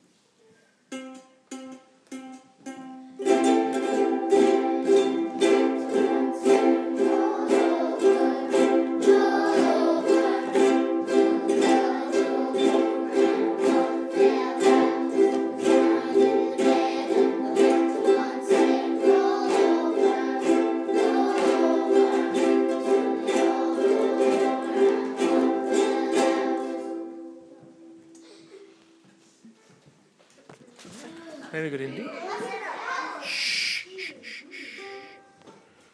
Ten in the bed, up down strum, This is the first groups 'Up down' strum on the C Chord.